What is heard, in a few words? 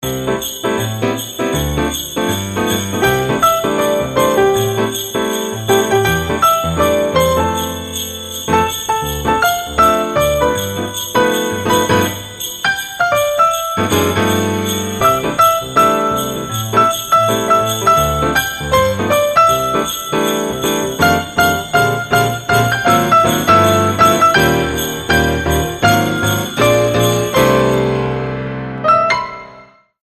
Jingle Bells